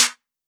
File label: Snare; SSO Snares